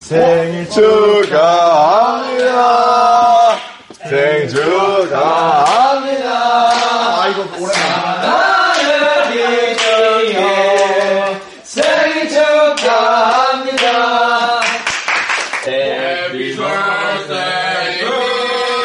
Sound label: korean song